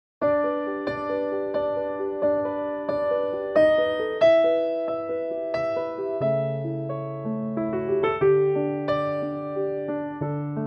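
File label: piano G, male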